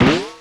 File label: tape fx, scratch; Ambient Grooves